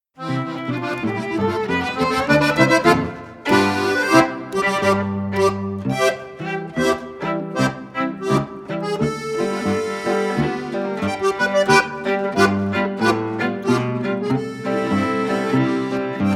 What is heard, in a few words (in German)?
C Dur Marsch